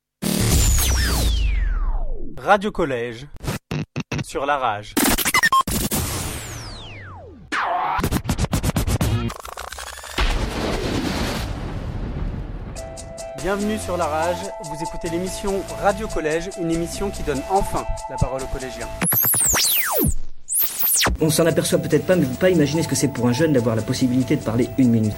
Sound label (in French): Jingle RADIO